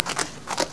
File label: horse asterisk; 250 Desktop Themes